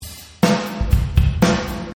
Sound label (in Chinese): 随机音乐包; 战中; 战斗音效; nba